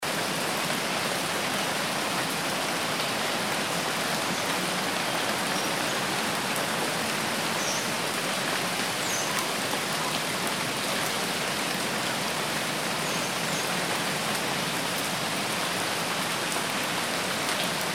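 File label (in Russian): сильный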